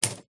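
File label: typewriter 1960 single key press; Smith & Corona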